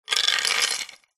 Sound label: industrial chain small set down wood